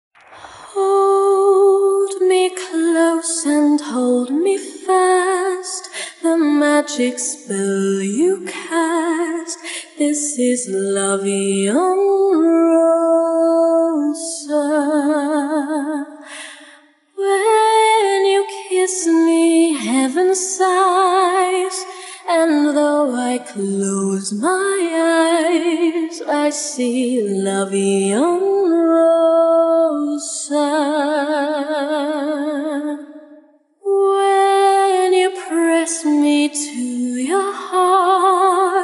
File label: vocal